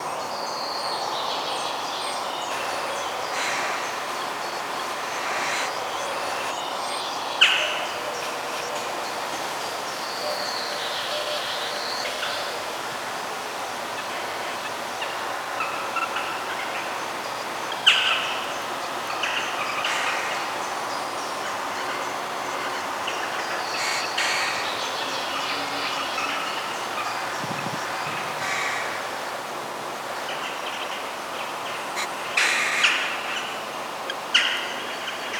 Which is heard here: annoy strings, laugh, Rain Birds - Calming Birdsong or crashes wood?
Rain Birds - Calming Birdsong